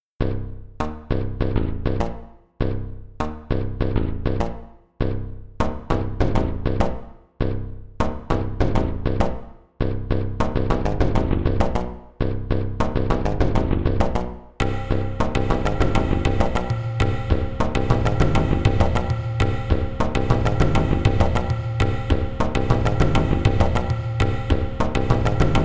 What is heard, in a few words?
Ethnos